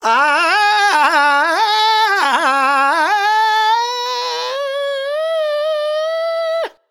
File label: Vox, Vocals Male, World Pop